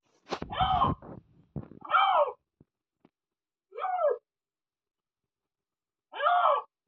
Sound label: lynx